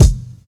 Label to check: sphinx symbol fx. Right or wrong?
Wrong